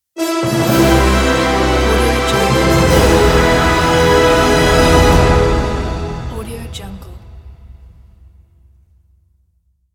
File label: orchestral fanfare logo